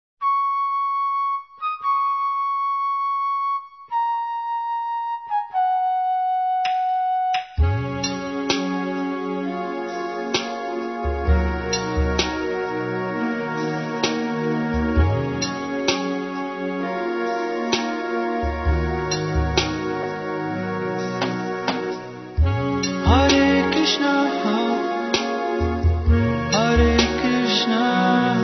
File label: Hare Krishna mantra (Reggae Genre); RKC Live Stream